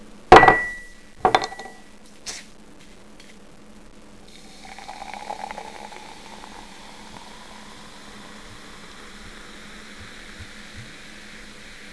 Brause; 999wavs

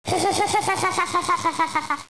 LACHEN; MAUMAU

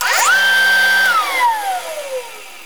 Bohr; 999wavs

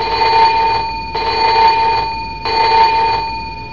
KLINGEL

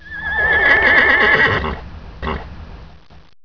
PFERD

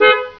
HUPE; DRAGO

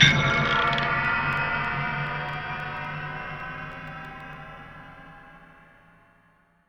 UFOS GONG; EFFEKTE; MAGIX

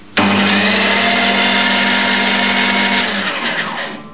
SAEGE

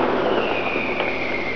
PFEIFE

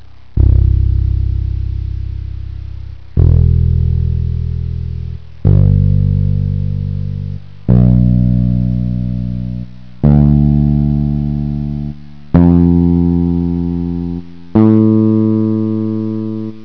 EINZ TON; 999wavs

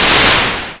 Rakete; DEMOUK

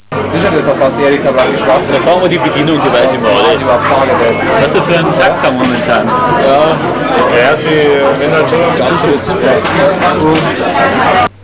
Kneipe

